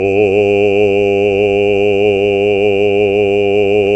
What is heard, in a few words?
BASS, Roland; Sound & Vision - Gigapack II CD 1; GREG. BASSES